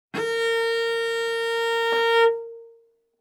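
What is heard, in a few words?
Cello arco fortissimo sul A Bb4; MIS Pitches - 2014; Strings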